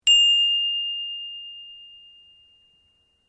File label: Bell Handbell Priest Japanese Ring, Japanese priest bell; Bells; Musical